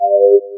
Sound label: KDE Window Iconify